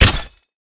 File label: stab